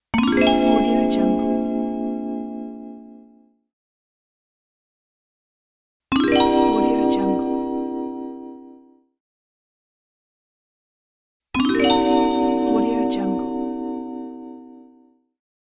magic

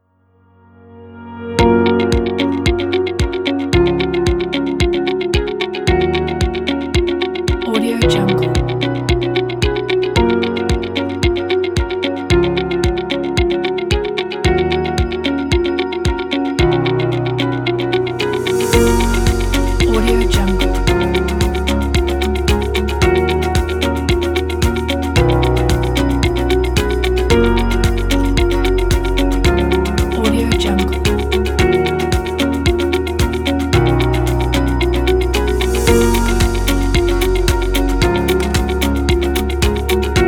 corporate minimal uplifting

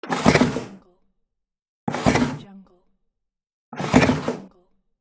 foley item grab pickup pack

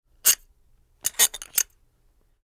bolt scrape

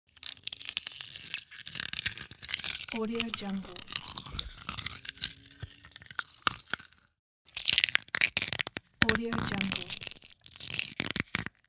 grinding teeth

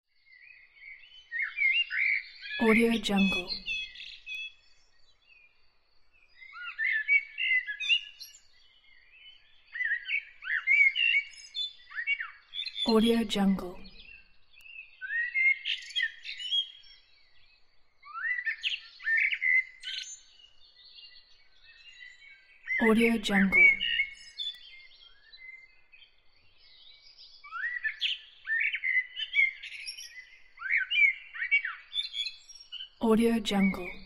bird singing early in the morning

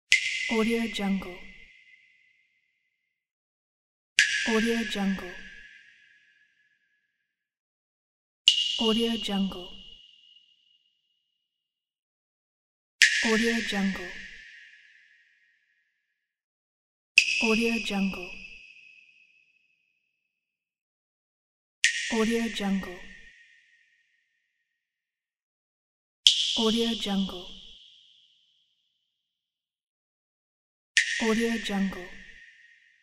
dungeon drops

